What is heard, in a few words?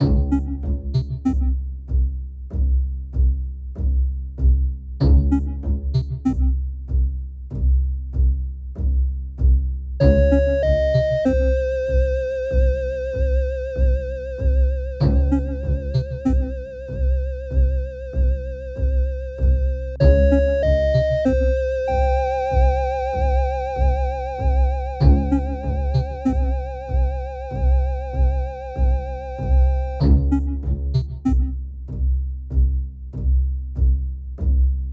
Cave; Prodigal Soundtrack (2020)